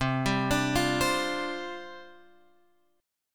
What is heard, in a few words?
C Suspended 2nd Suspended 4th C Guitar Open F; arpeggiated; Csus2sus4; x,7,7,9,8,7